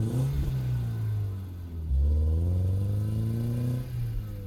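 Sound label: engine low hit; Blind Drive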